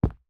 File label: step; chat grid